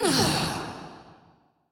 Enemy Boss Break Shield; bloom minigame

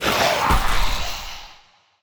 enemy spawn; bloom minigame

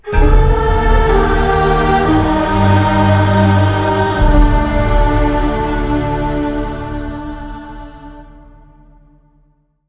Stinger Death 126 BPM

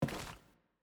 Footstep Glass

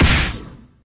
attack light; bloom minigame

